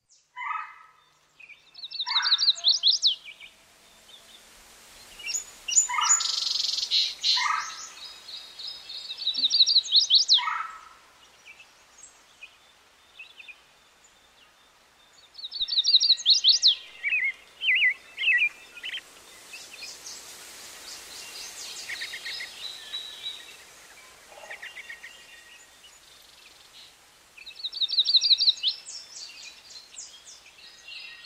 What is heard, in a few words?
spring backyard birds